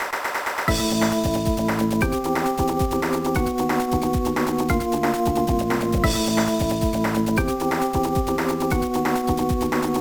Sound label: Enemy Dialogue; one piece going baseball kaizoku yakyuu